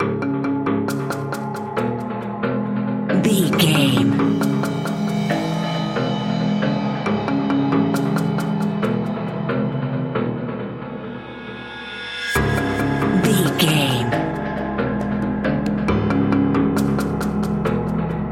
wilderness tension

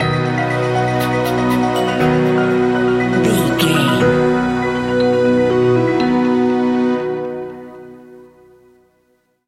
magical video game level stinger

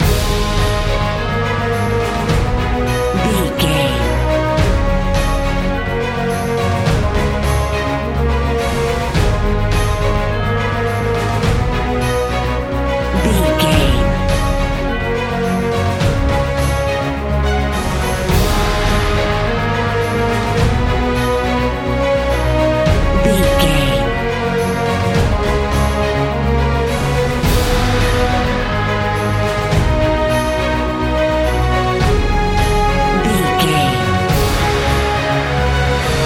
epic cinematic loop; all according plan

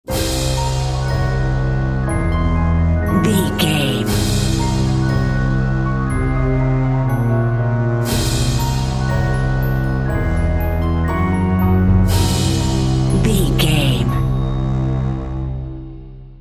trapped dream bumper

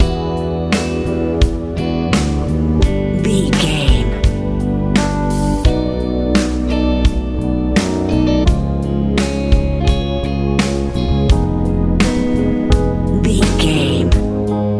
hopeful good pop ballad

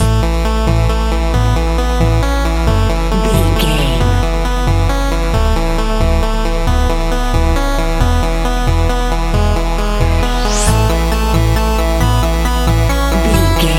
beauty 8 bit loop

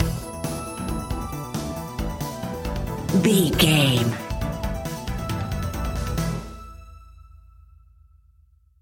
80s synth rock anthem stinger

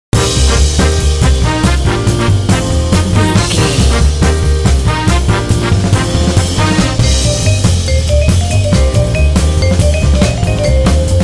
uptown swinger

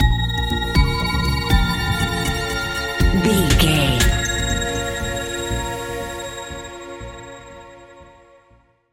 mysterious video game expedition stinger

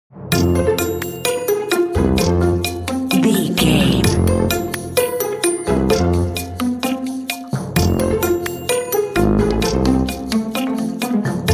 silly fun

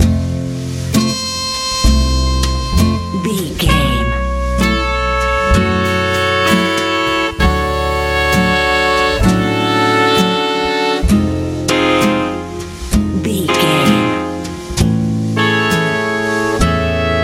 jazz

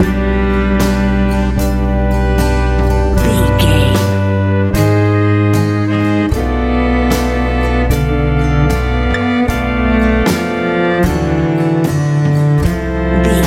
classical pop

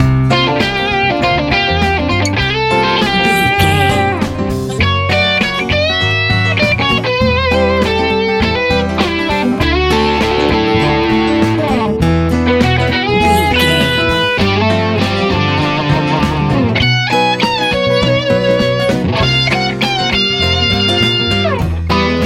bright good swirl big love easy rock pop

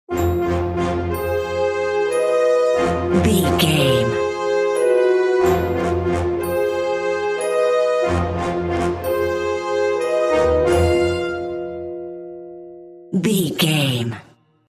black magic brewing bumper

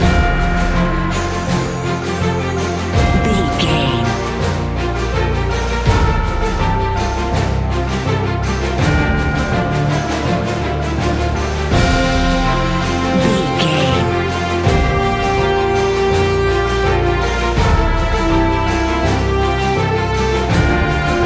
cinematic corporate loop

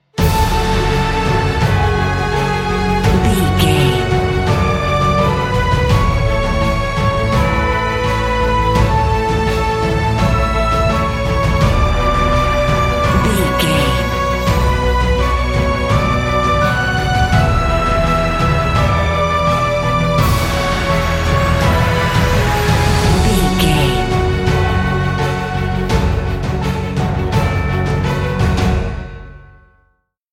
epic emotional

